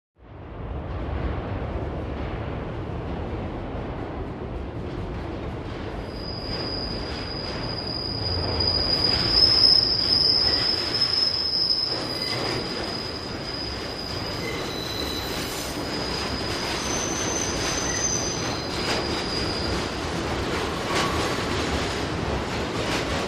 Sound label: Subway Platform Trai, subway